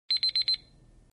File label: alarm